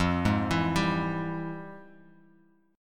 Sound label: F Minor Major 9th, chord; FmM9 arpeggiated; JGuitar